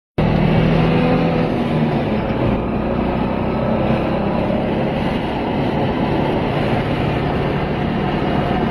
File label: transmission thudding noise 350z test drive